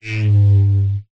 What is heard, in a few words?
household squeegee wipe clean glass window